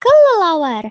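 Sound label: bat; kelelawar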